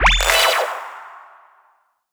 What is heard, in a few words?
Gravity Switch FX AMaj7 Sus2; Video Game; Cymatics; Video Game Sample Pack Vol 1